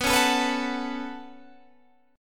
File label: B 7th Suspended 2nd, Guitar, Standard; B7sus2; strummed; JGuitar